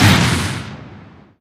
giant common step; source engine; tf; mvm; video games

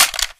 grenade launcher action closed; weapons; source engine; left4dead2

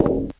glass, footsteps, walk

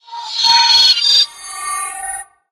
sphere socket close; Source Engine; Portal 2

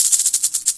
rattle, headcrab poison; Source Engine; video game; npc

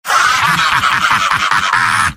laugh evil; demoman; mvm

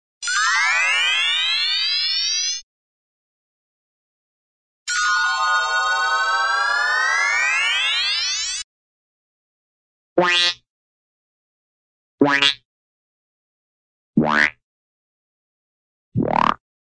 electronic magic; cartoon

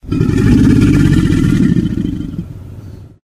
crocs growl; Source Engine; tf; lair

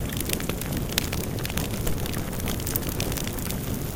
medieval brazier, ambient; Source Engine; tf

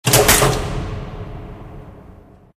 lights on; ambient; Source Engine; tf

